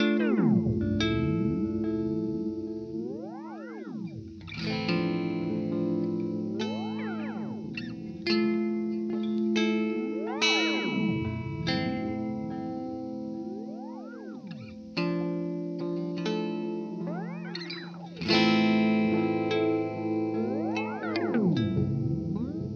Teisco Delay Modulation

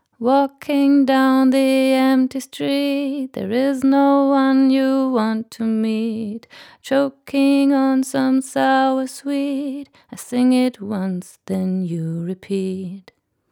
Rode NT1 Vocal Maihak V41 EQ

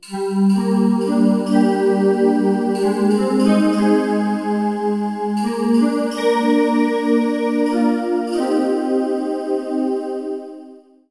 D-50 Chorale

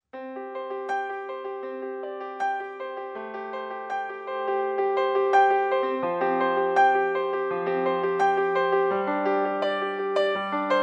nord piano

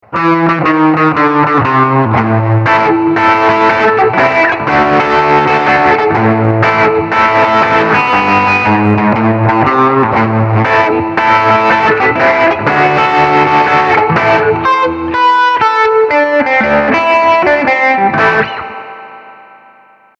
Cube 80X Tweed Tremolo Spring

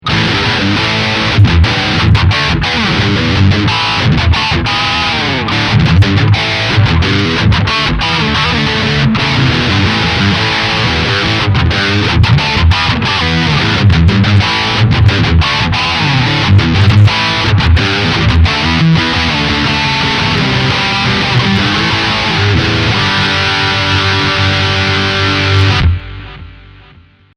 Heavy Crunch Neck Pickup

